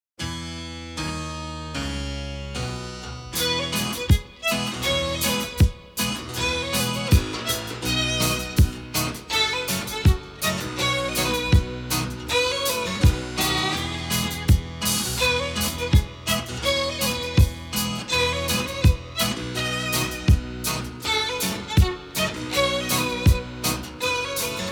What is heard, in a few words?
Reggae